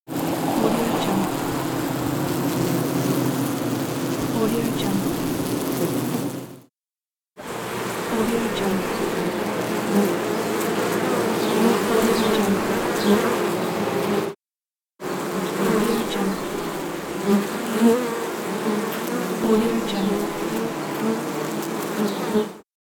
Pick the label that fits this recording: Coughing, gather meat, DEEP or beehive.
beehive